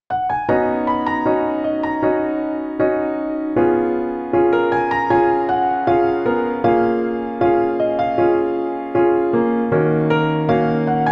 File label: Piano ver